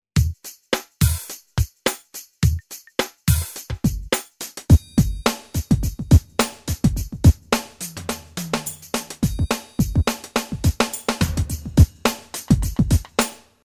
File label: pop soul